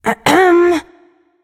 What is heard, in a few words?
clearthroat; smite; voicepack; persephone